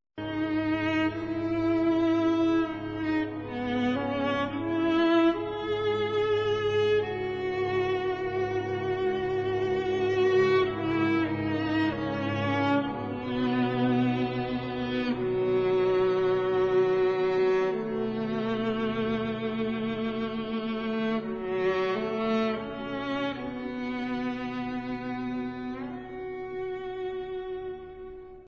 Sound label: viola; western